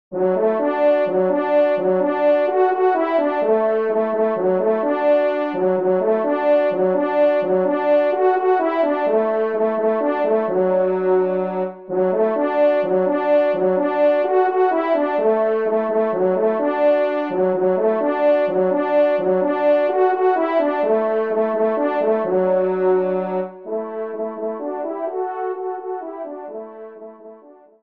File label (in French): marche, trompe; 2e Trompe; Marche de Venerie; Auteur : Jules Millescamps